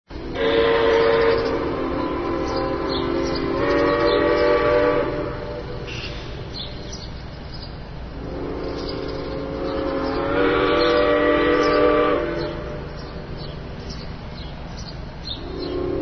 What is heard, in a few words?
locomotive